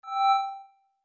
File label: bright, dice; dice-so-nice; FoundryVTT